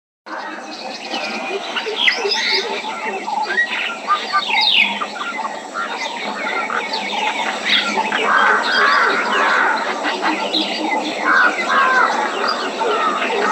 Birds Noise Ambient; Eternal Darkness Requiem

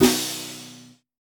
aggro

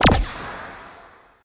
Implode

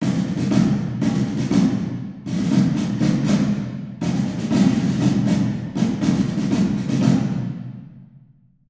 Wins Drums Mono; Agatha; chivalry medieval warfare 2012 pc

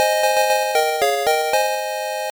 Find Item; Golden Axe Warrior; Master System

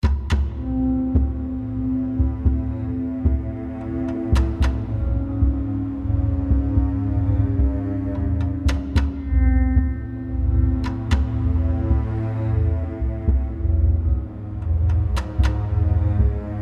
Combat; Cannibal Combat 1 Combat Chunk 4

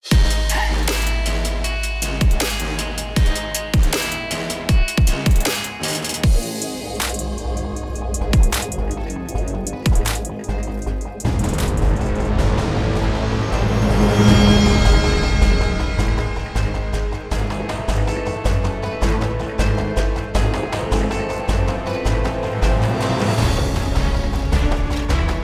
In-Game Music; fast furious spy racers rise of shifter arctic challenge

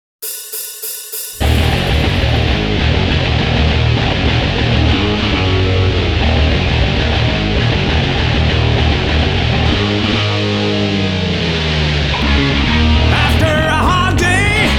Drum Minus Track

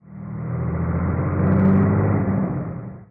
Truck; Gary Grigsby's War in the East

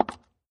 step sound; Arc of Templar

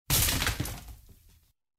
sharp wood crash

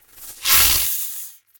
attack, Flying Insects

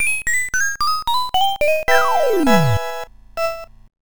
Zapped; The Tower of Druaga; TurboGrafx 16

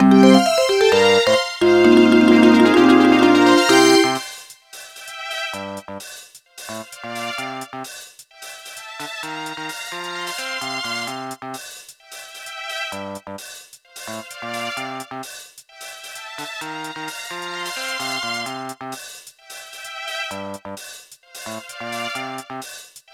Game Clear; Flowoooot

